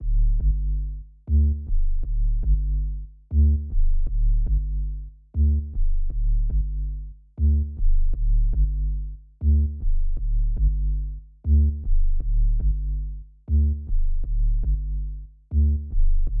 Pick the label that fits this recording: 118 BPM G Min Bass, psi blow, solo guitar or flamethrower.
118 BPM G Min Bass